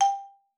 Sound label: XYMF G4, XYLOPHONE; Xsample VOL-4